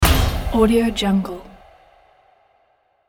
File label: trailer impact